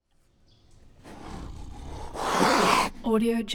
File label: tiger hiss; prosoundfx